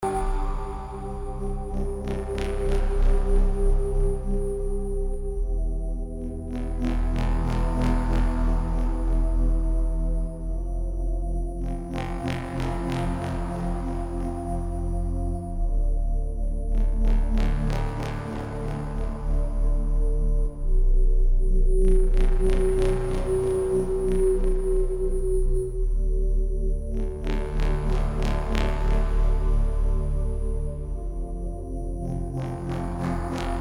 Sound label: gamejam bg